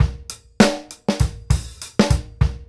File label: groove, Grooves 99-132bpm; E-MU Producer Series Vol. 8 – Platinum Phatt